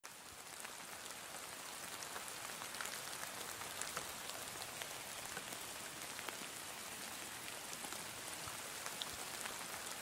rain; mimban; star wars rp mimban vidmo

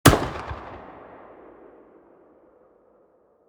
fire dist, 40mm; gmod; arccw uc commons attachments

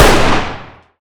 fire dist 300blk rif int; gmod; arccw uc commons attachments

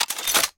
weapon pickup; weaponweights content

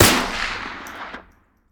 fire dist; gmod; arccw uc commons attachments

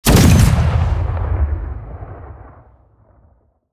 fire dist, rocket; gmod; arccw uc commons attachments

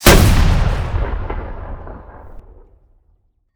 fire, rocket; gmod; arccw uc commons attachments

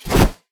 hammer holster; melee; tfa starwars melee weapons pack

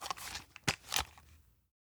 movement smg; gmod; arccw uc commons attachments

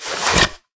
board draw; hammer; melee; tfa starwars melee weapons pack

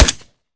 fire sup; gmod; arccw uc commons attachments

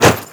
fireaxe heavy; tfa starwars melee weapons pack

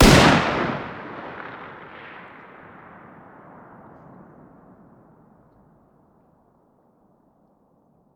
fire dist 308 mg ext; gmod; arccw uc commons attachments

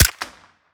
fire, arccw sound; 22 sup; gmod; arccw uc commons attachments

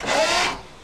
hydraulic; gmod; lfs luna s flight school; laatc atte

